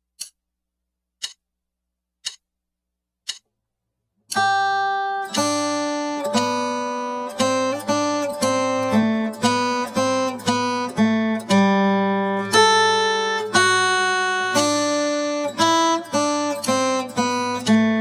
pickings studies in G slow

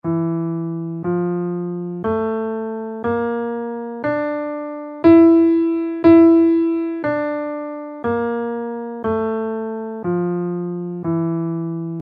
iwato scale in E, guitar

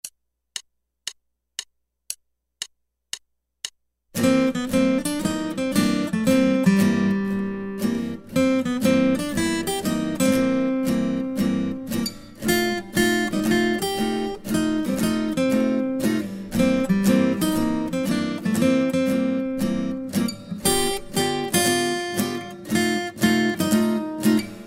Guitar

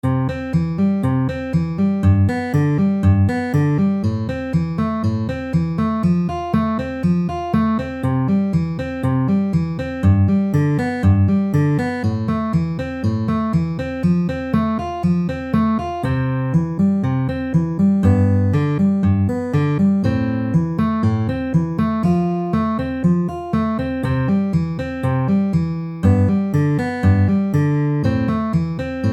Basic Travis Picking Patterns at 120 bpm

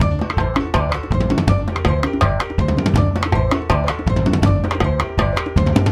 merengue

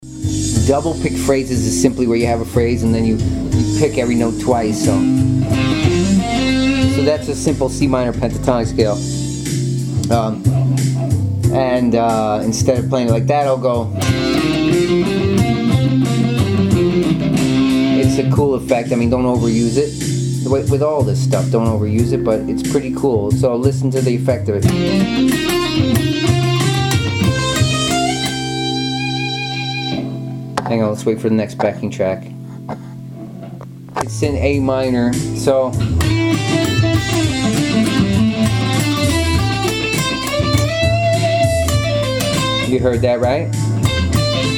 expressive elements double picked phrases

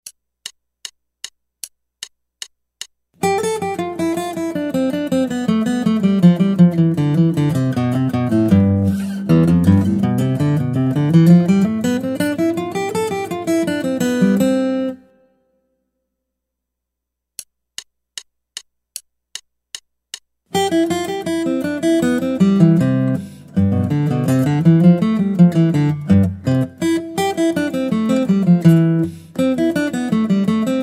Guitar

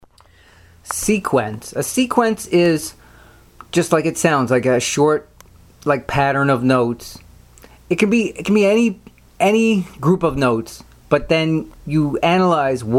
varying a melody

